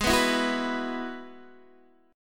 Ab Minor 7th Sharp 5th Ab, Guitar Open D; x,11,9,10,9,9; down

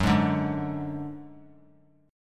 Gb Suspended 2nd Sharp 5th 3C,3F,3A,3D; Gbsus2#5 strummed

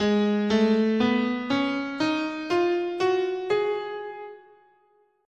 Ab Phrygian sharp6

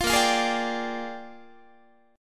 Bb 13th Bb Guitar, chord; strummed; 20,18,20,18,18,18

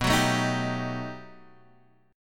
B 7th Flat 13th B, guitar chord

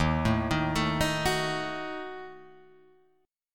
Eb Diminished, Guitar Open G; 1,2,1,2,4,4; down

